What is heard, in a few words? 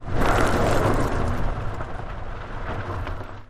Car Tires Dirt Skid; tires on dirt